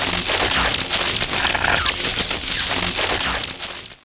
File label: lance field attack in operation spark